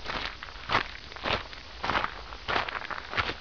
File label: walking; 10,000 Graphics Pack Volume 1; Media Graphics International